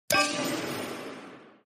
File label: Click Spin